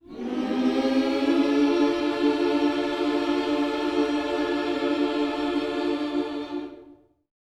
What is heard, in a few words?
MM MINOR, Gospel; Spectrasonics; Vocal Planet; MIN. SCOOPS